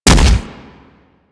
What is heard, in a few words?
Impact Flesh, Weapons; Warframe; Furax